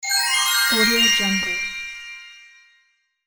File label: magical xylophone transition